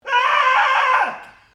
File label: Scream